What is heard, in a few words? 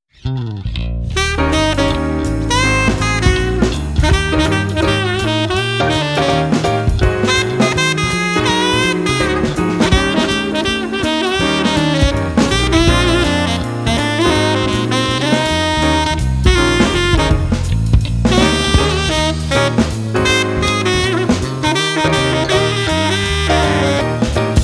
Tenor Sax; Swing